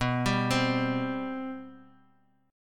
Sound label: C 5th B; C5/B arpeggiated